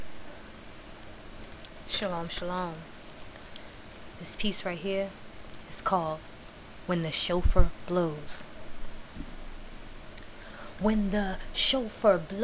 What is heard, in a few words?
shofar blows